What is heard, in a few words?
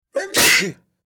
Sneeze